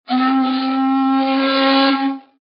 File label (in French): Trompe